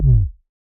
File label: Ball Collision Forcefield Light